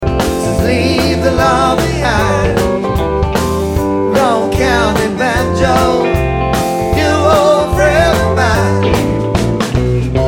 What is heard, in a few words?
Banjo